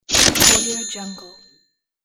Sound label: cash register